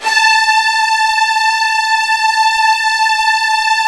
A3, Virtuoso Strings; ILIO; Kirk Hunter Virtuoso Strings VOL-1

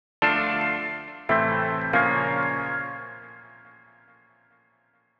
Piano Riff Bb-A-Gb-Db, Grime, 140bpm; Zero-G Sound Sense Grime Scene; GS Construction Kits; Just Flex

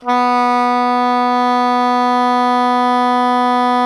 Soprano MF B 2, Saxophones; Greytsounds; Philip Wolfe David Crozier's Saxophones

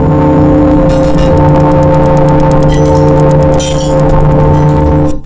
loop, techno atmos

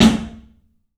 club snare, 3D Drums; 3D Sound

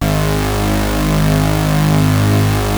Prophet 5 G#2, Synthesizer; Hollywood Edge - Giorgio Moroder Rare Synthesizer Collection

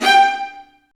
String Sections, Violas Marcato; Roland LCDP13

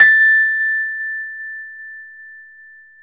Rhodes 73 Slow; E-MU Producer Series Vol. 5 – 3-D Audio Collection; 3DRhodes

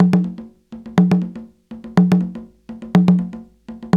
JU JU, African Contemporary

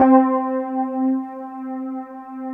WET RD C3 L, Keyboards Old School; USB Soundscan vol.09; AKAI; WET ROAD

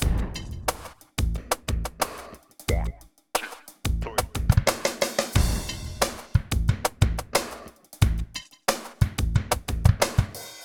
90 slow, loops, hyper dance; Best Service

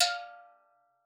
F4, STEELDRUMS; Xsample VOL-4

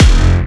Jump Kicks, Bassdrums

